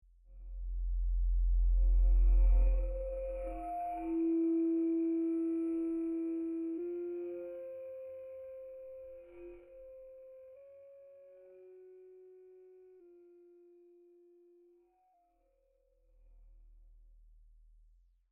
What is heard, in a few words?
Wind